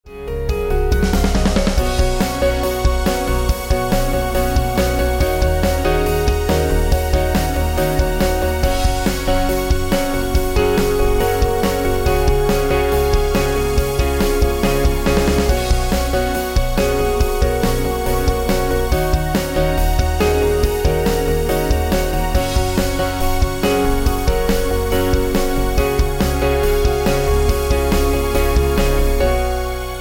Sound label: Corporate Motivation